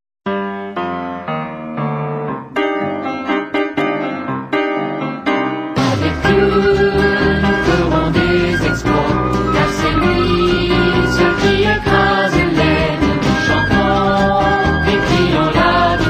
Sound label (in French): Chant de victoire, cantiques